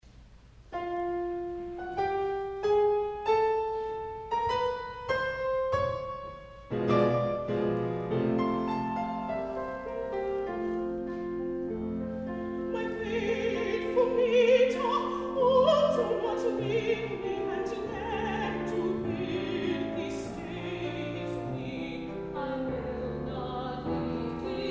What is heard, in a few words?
Duet